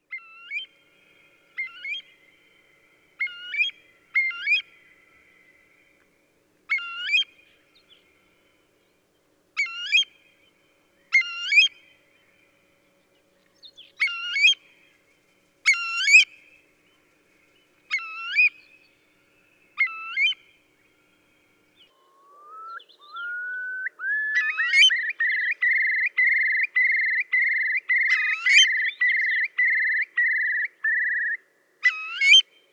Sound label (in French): Courlis cendré